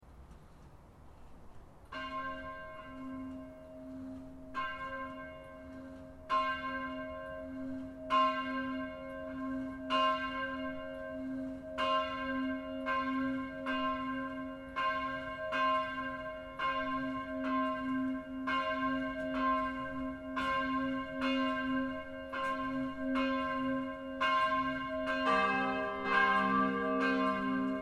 ancienne sonnerie, cloches; PREZ

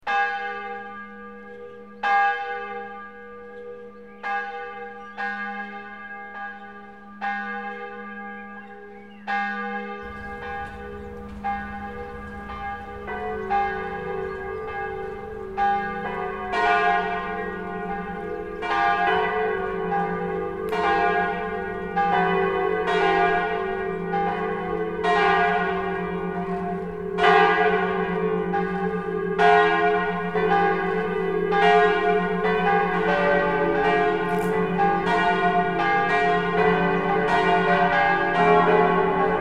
Moudon temple avant correction

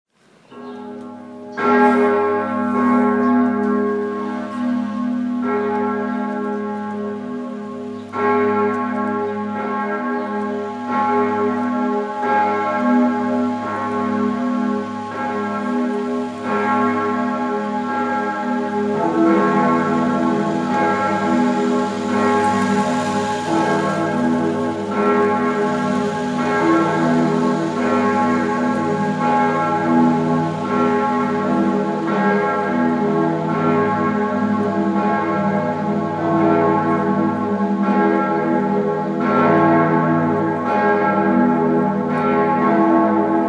BERNE cathédrale SONNERIE DU VENDREDI SAINT cloche MI2 SOL

